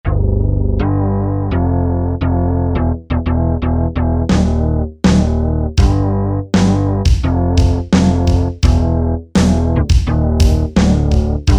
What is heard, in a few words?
Bass-Drum, Korg MSC-1S Variety Combinations